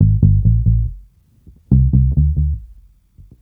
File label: Bass Loop, Ambient Grooves; Sonic Foundry (Sony Creative Software); Basses